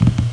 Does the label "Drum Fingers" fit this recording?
Yes